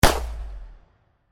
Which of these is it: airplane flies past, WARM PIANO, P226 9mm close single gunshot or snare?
P226 9mm close single gunshot